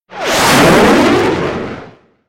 Jet Sound 3d

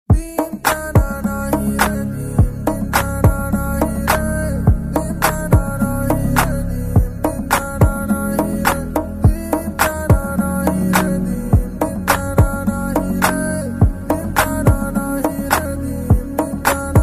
Kick Telugu

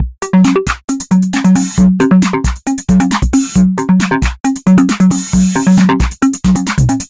Electro Techno

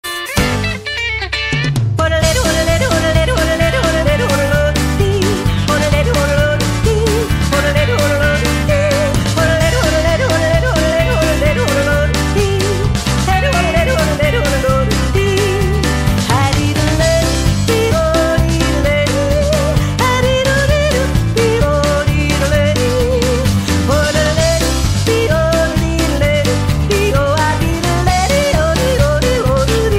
Yodeling; Spiderman

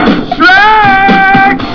Donkey

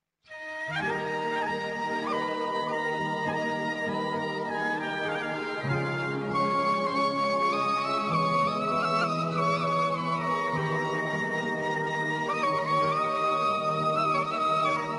Sad Flute Tone

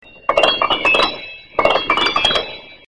Piano Alto

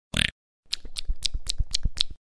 Eating; Pou

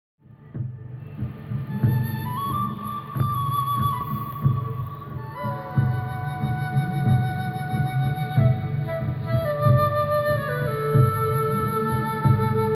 Flute

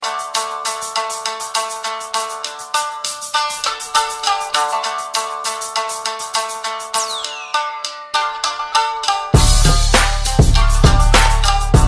koto

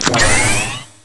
Belt Sound

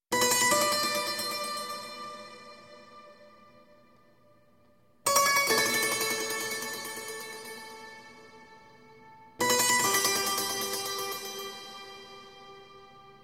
Prelude dulcimer

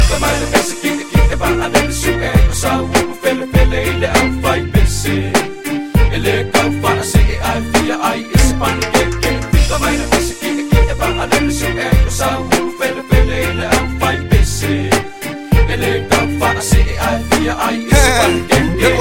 Samoan Jam

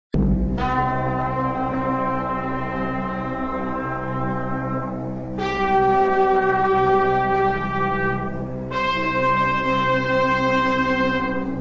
Worst Orchestra

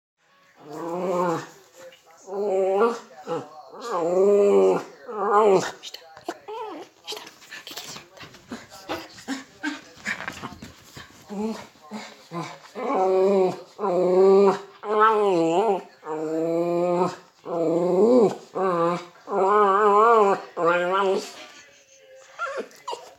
Westie being weird

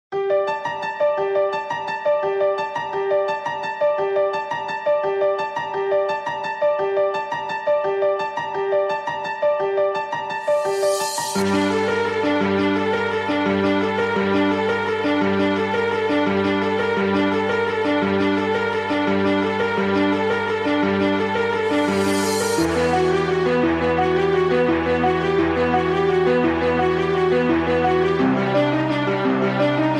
Remix

